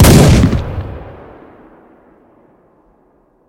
oglushitelnij pushechnij vistrel

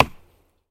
zvuk udara po derevyannoj stene

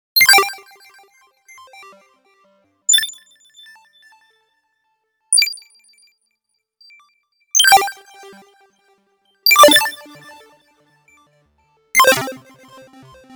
igrovoj interfejs v stile haj-tek

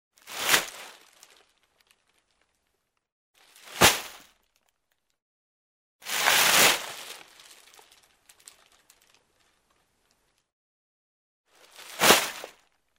meshok musora podnimayut brosayut

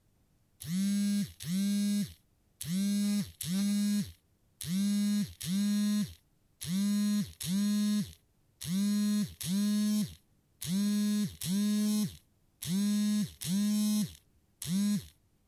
vibraciya telefona

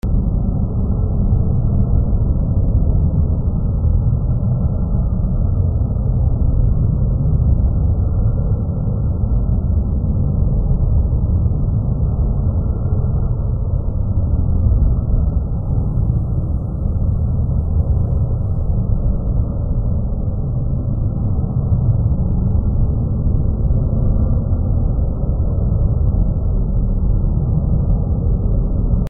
zhutkij fonovij zvuk

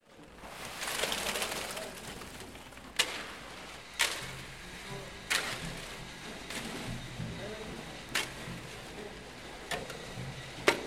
zalivka na nebolshoj stroitelnoj ploshadke v dome

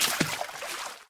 vsplesk bolshoj ribi v vode

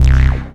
knopka cifrovoi odinochnii nizkii blizkii vibriruyushii

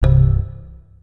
nizkij zaryad batarei cistemnij zvuk windows xp

